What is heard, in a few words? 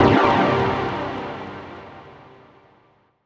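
deep house FX, 125 bpm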